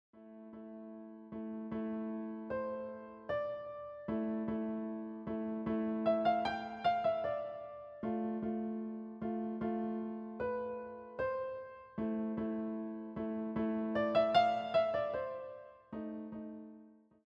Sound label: Musette; No 8 Du rythme A l expression Book 1 Op.108; Absil